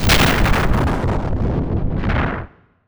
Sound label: digital explosion